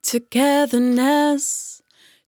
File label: 100bpm Bm, Velvet Vocal; Zero-G